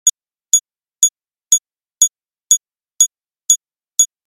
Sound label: Data download beeps beeping